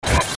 CLICK METALLIC; Clicks (Sound Effects:Multimedia)

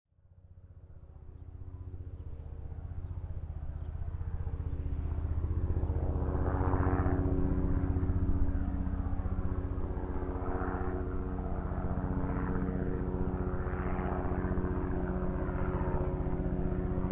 Twin Propeller Airplane Passing Overhead; Aircraft, Long sound of a large, twin propeller airplane passing ov

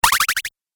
Arcade Game Slippy Surface; Video Game Sound Effects; Retro Inspired Sound Effects - Think Nintendo, Atari, C64

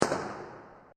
Massive Firecracker Bang; Fireworks; Backgrounds; CraftedSignals

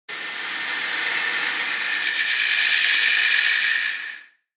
Amazing Alien Magic; Alien Sound Effects; Sci-Fi Sound Effect

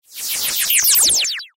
Digital Electronic Whoosh; Blastwave

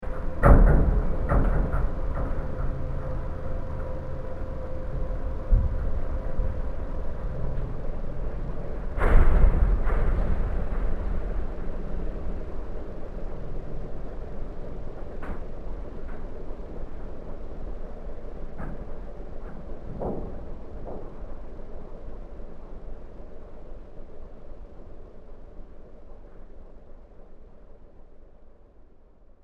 Mothership Loading Bay, spacecraft sound effect; star wars / trek style games; Andrew Fleming

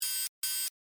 Alarm Beep, Scifi, Alarm, Beep, Science, Fiction; Tech (Sound Effects:Science Fiction)

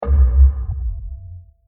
Deeply Mangled Impact; Digital; Impacts; CraftedSignals

